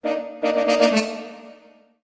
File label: Music Game Attack